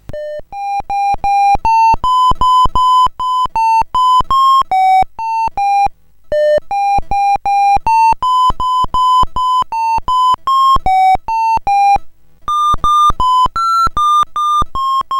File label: Christmas Tree, sid CSG8580R5